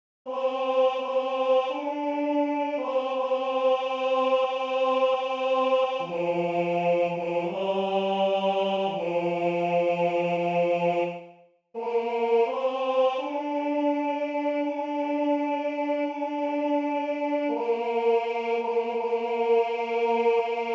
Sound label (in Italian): se tu sei con me Tenore